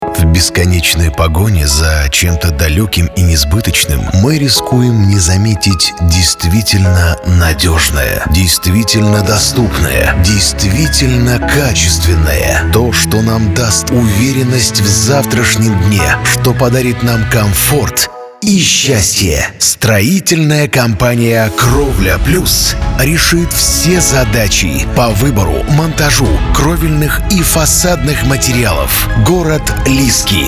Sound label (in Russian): Epic razmerenno; Krovlya Pljus